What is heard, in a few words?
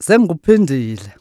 Vocal Chirp, African Voices